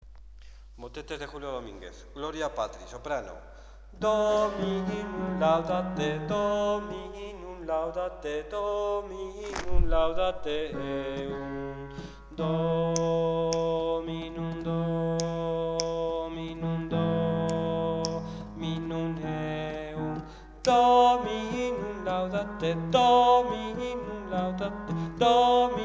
gloria sop; 3 motetes

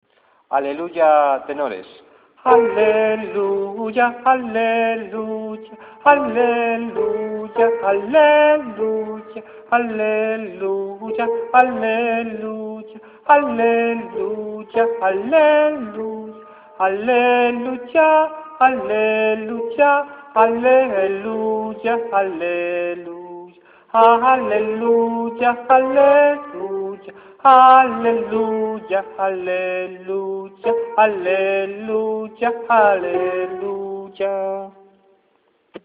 aleluia tenores

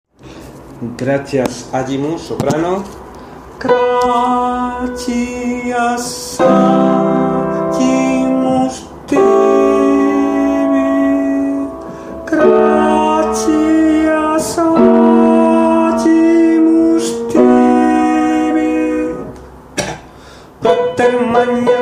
gratias gloria sop

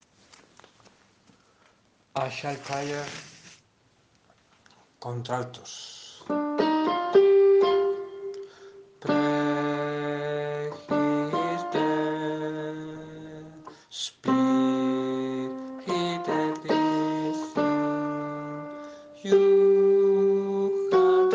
child prayer alt

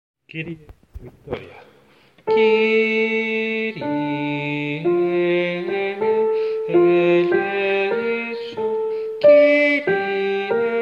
kyrie sop; victoria

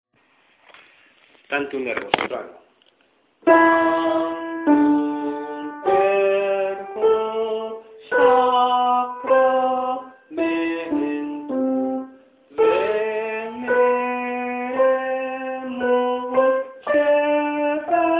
Tantum ergo sop; bruckener

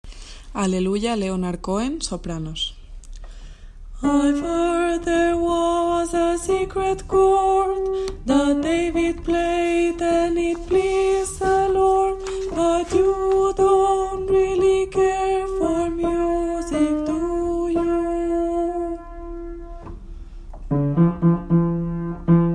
hallelujah sop

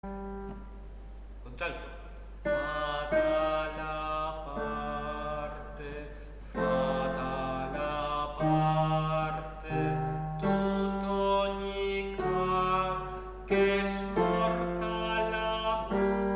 fata la parte alt

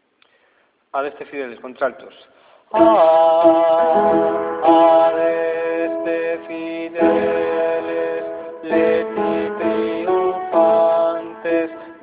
altos; adeste

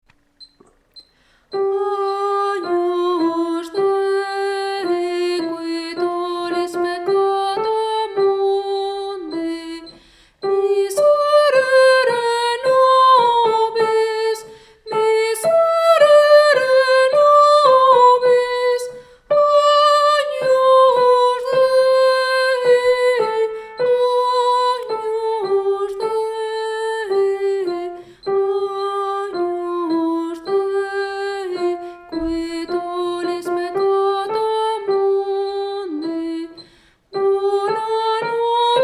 Agnus dei piano

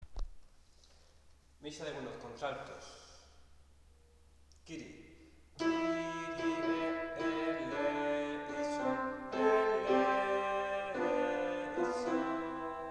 kyrie alt; gounod

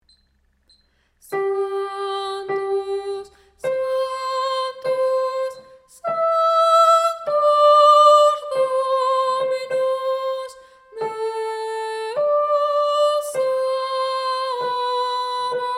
Sanctus con piano

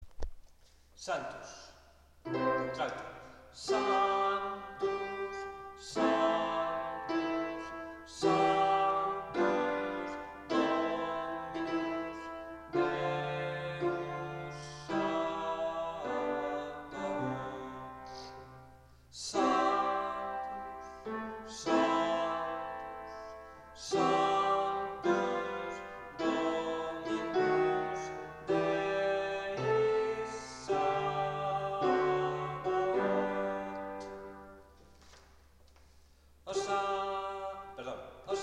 sanctus alt; gounod

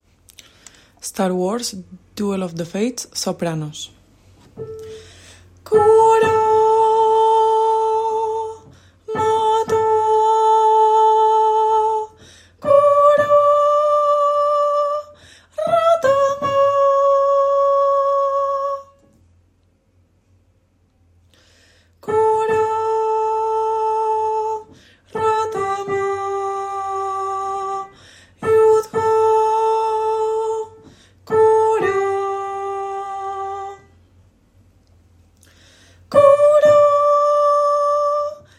duel sop

